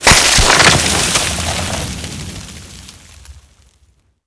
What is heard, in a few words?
fall snowbreak; cliff; Call of Duty Modern Warfare